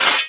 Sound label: Catblades Draw; Playable Tutorial Level